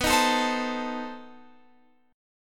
B 7th Flat 9th B Guitar Open F; B7b9; strummed

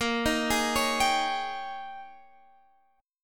Ab Major 7th Suspended 2nd Suspended 4th

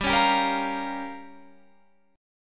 C sharp 6th Flat 5th G

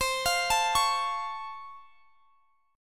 Db Augmented Major 7th, C

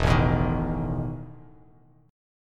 E Major 7th Suspended 4th Bass Standard; strummed; JGuitar

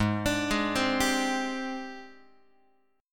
Ab Major 7th Flat 5th Ab, down, Guitar; AbM7b5 arpeggiated; x,4,5,6,4,6

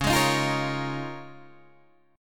Db 6th Add 9th Db, down, Guitar Open D

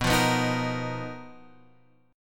B Major 7th Flat 5th B down, chord shape

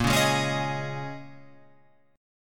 A sharp Minor 11th, Guitar, Open F; strummed; JGuitar

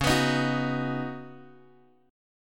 C sharp 9th Suspended 4th; C#9sus4 Chord {X 4 X 4 4 2} strummed

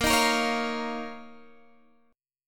F 7th Suspended 4th Sharp 5th Bb

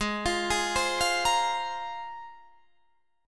G sharp 6th Add 9th, Guitar, Open D

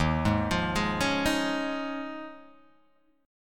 Eb 9th Suspended 4th, Guitar, Open G

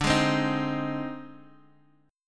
Ab Major D, Chord; strummed; Ab/D; JGuitar

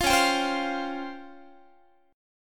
C sharp Major 7th Flat 5th, C sharp, Guitar; strummed; 20,21,22,22,21,x

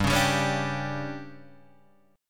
D Major 7th Suspended 4th, chord

